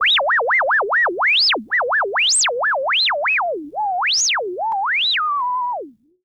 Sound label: WEIRD Resonance Zone